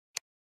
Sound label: click; codem-bank